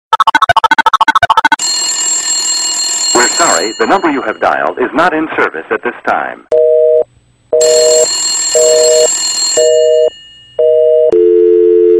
Old phone

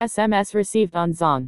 SMS Received; Zong

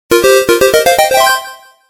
8-Bit; Brawl Stars

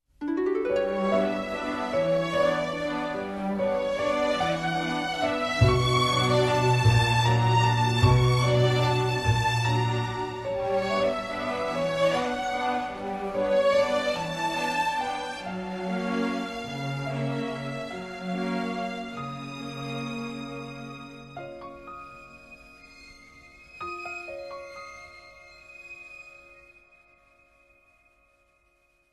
waltz

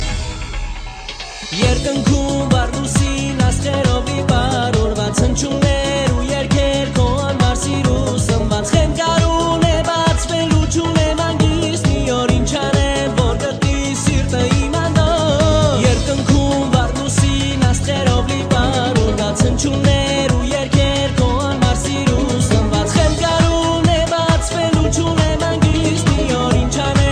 Armenian

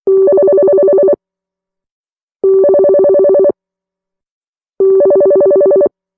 Old Ring

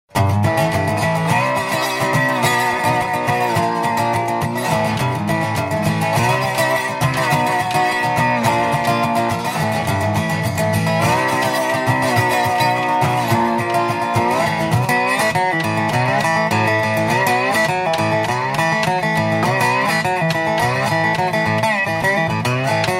Slide Blues Guitar

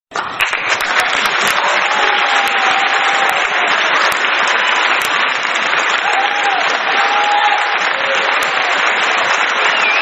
crowd applause

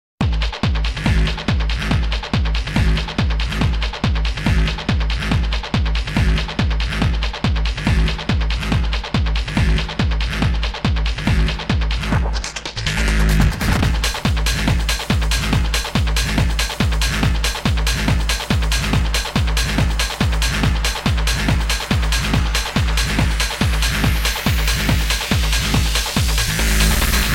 Overdrive

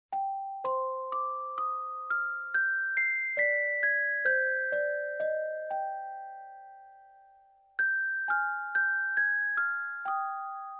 Melody

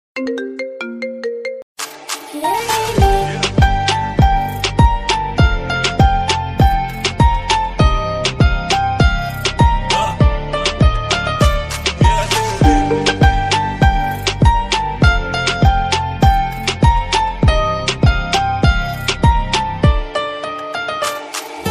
MARIMBA

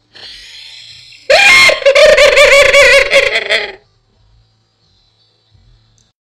Witch Demon Laugh

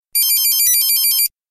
electronic

